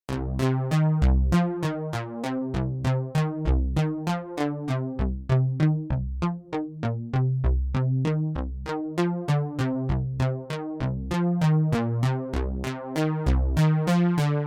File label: Eventide H3000 Micro Pitch Shift